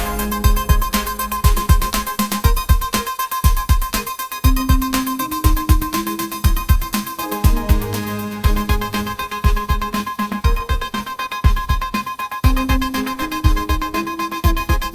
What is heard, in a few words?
upbeat pop melody, ringtones